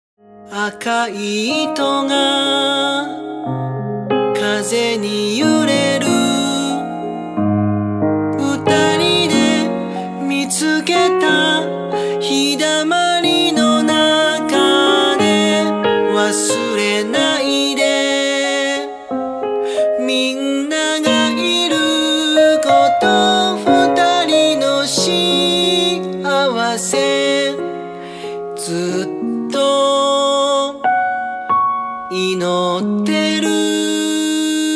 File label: solo